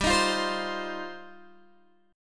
G 7th Suspended 4th Sharp 5th G, Guitar Open G

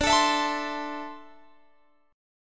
D 7th Suspended 2nd down Guitar Open G

